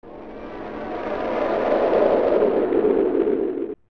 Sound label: Wallmaster Shadow; OOT